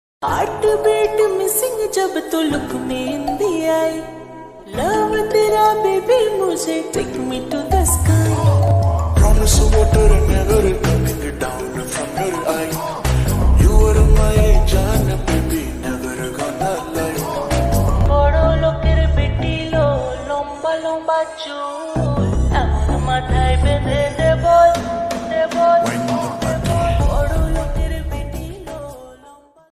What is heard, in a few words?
slowed rap ringtone